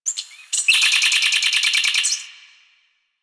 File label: Valley Tree Critter; Ambience; Warframe; Quests; The New War